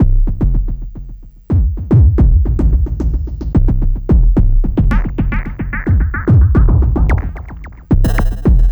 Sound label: Miami Bass Fuzz, Modular Electronica; Beats; Sonic Foundry (Sony Creative Software)